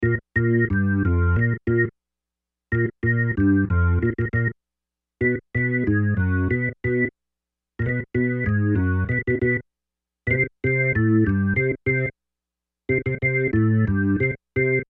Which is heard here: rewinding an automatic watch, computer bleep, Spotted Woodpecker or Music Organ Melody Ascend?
Music Organ Melody Ascend